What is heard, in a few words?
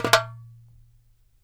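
DARABKA roll, Mediteranean Traditions percs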